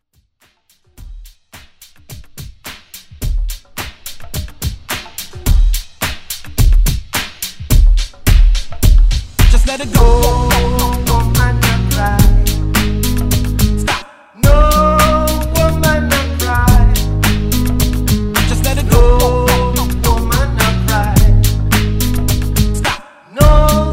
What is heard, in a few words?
Throwback Redrum